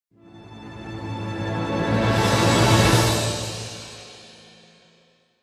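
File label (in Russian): еще та да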